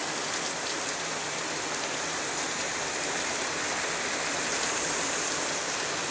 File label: Fire Hydrant Water Spilling Loop; Props Fire Hydrant Water Spilling Loop; gamerip